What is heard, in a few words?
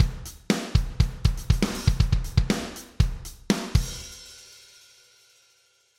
drums reacomp